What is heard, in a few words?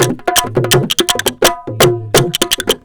DHOLAK, Indian Traditions; USB Soundscan vol.45; AKAI